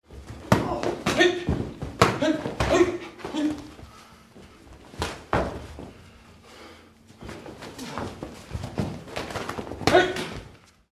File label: Karate dojo ambience people warming up